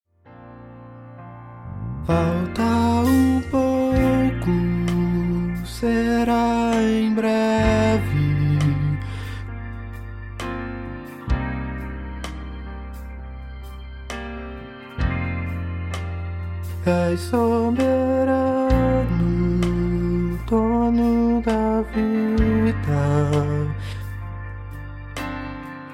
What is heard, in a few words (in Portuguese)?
BAIXO